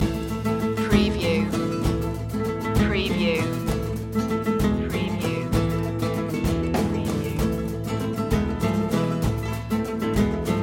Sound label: andean nights loop